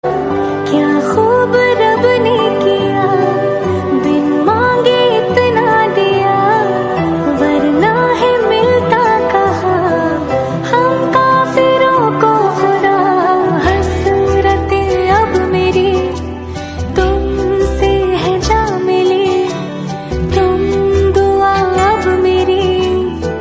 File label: Love Song Female Version Ringtone